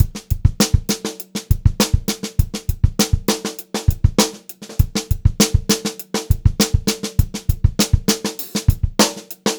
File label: BEAT, Funk-Groove Drumloops; USB Soundscan vol.38; AKAI